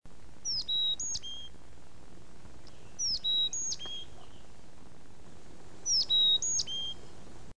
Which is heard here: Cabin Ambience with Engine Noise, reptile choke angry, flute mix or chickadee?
chickadee